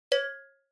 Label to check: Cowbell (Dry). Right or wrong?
Right